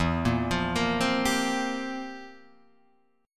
F Major 7th Suspended 2nd Suspended 4th, E, guitar